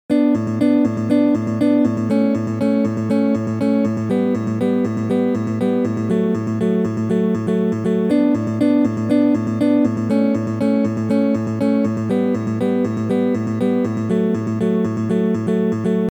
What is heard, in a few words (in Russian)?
Ritmicheskij risunok Galop